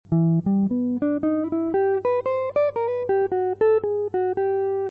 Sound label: major phrase